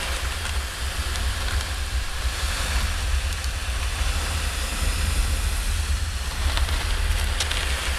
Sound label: plastic slide loop; teardown; ps5 windows xbox series xs